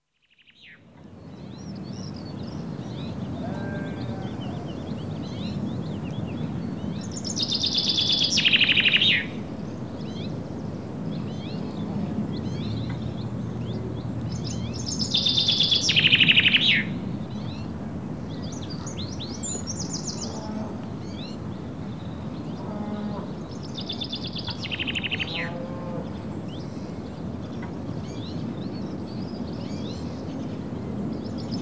Summer Afternoon In the Countryside - Birds Calling; Dr. Sound Effects; 210 Nature Sounds - 20 Hours of Relaxing Natural Ambiences for Meditation and Sleep